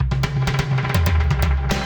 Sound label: Dubbed Tom Fill Old School 128bpm; Ableton; Factory Packs; Session Drums Studio